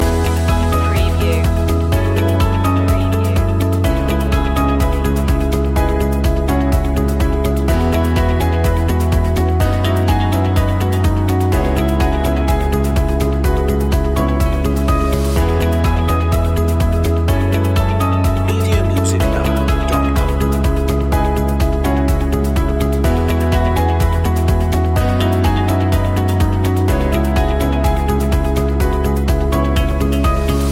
steady progress loop

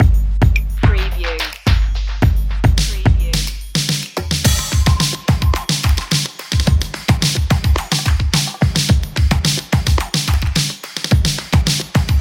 play that drums; 15secs

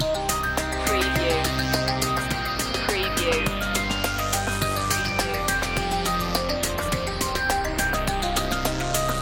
ambient breaks loop

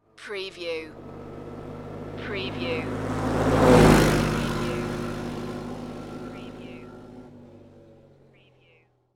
Quad Bike Drive By Center

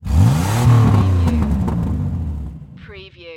Power Car Single Rev

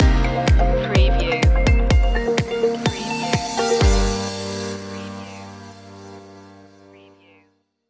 summer clubbin stinger

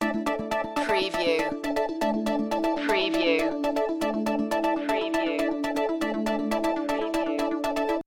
newscast music loop

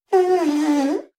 Whoopee Farting Blowing Raspberry Sound

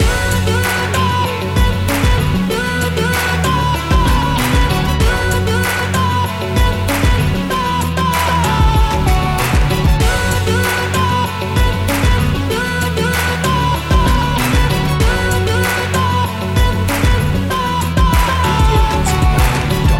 full throttle loop

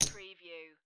Poker Chips Drop One Chip Onto Another Chip

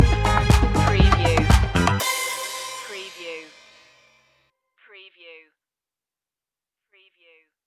latin dancing stinger; media music now

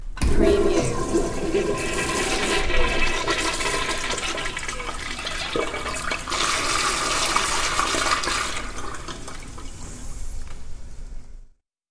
Pub Toi Flsh; Household Sounds / Bathroom Sounds

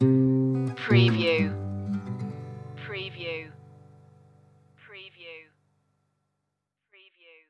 delta mercy stinger

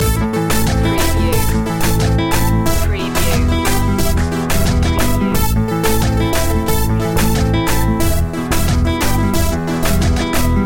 splanglish reaggaeton loop

